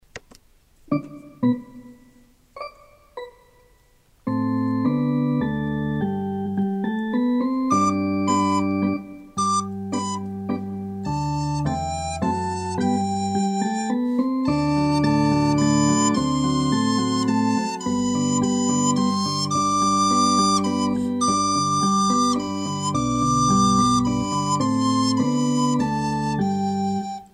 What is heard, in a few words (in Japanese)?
かっこう①（伴奏＋メロディ）, リコーダー; ４年生; 成隣小学校 WEB音楽室